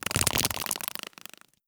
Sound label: idle clicks, headcrab black; creatures; vox